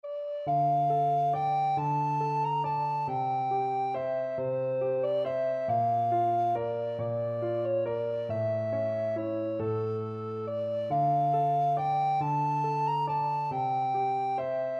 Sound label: greensleeves, alto recorder